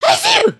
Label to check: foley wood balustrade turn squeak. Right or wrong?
Wrong